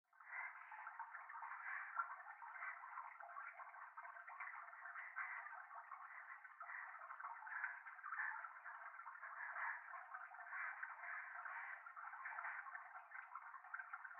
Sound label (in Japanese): トイレ 右耳快感吐息 川のせせらぎ